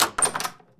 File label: door hover